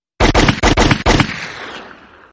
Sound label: Shooter Gun